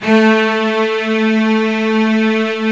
A 2 CELLO; CELLO 1

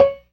PERC, Synth and Organ

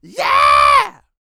YELL, MALE HUMAN; Spectrasonics Vocal Planet

